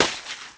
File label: snowball damaged; sfx